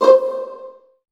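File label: viola pizzicato C2 right, Virtuoso Strings; ILIO; Kirk Hunter Virtuoso Strings VOL-2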